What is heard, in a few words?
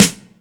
Beat Box; E-MU Pro-Cussion